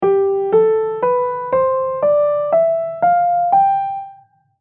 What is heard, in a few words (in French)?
Mode Mixolydien